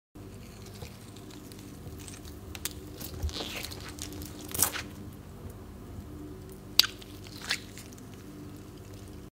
Crushing tomatoes; له شدن گوجه فرنگی

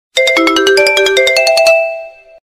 ringtone; Sony Ericsson

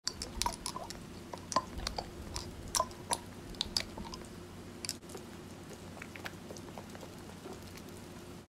dropper; قطره چکان چشم

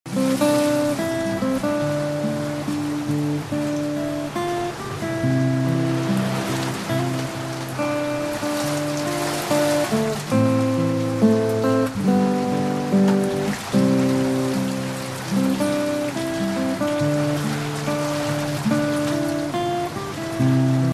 Guitar and rain

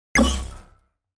Score points in game, امتیاز گرفتن در بازی

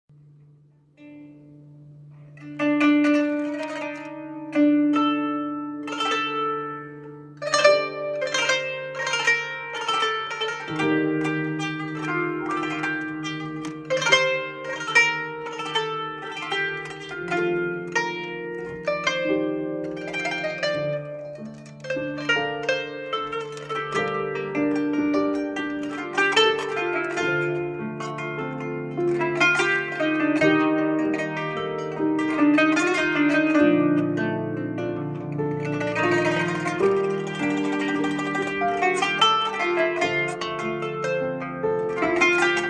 qanoon; ساز قانون

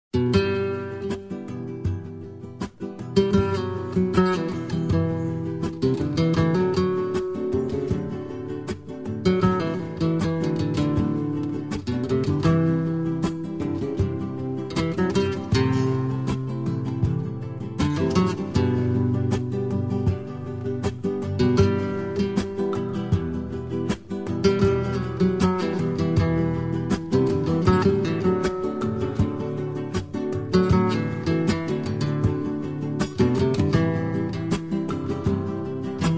Ringtone; گیتار

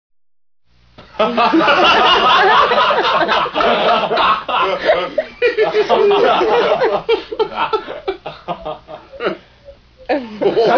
Laughing

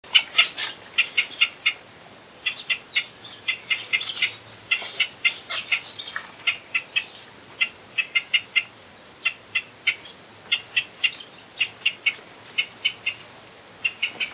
Pinto's spinetail

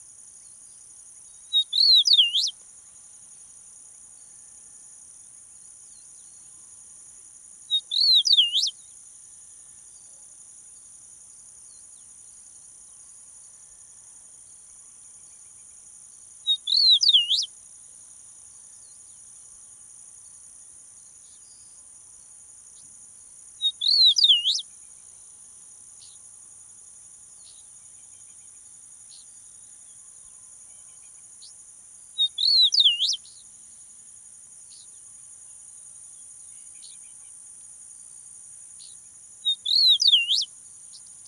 Eastern meadowlark

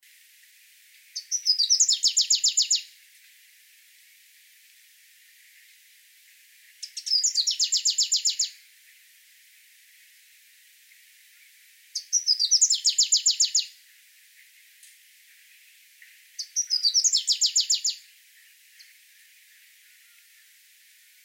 Variable sunbird

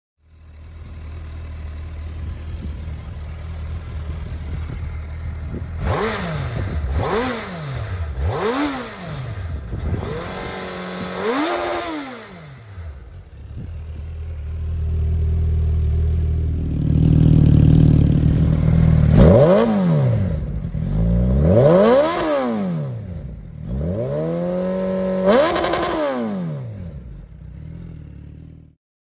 Racing exhaust

